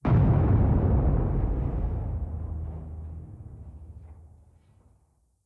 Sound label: Boom; blue archive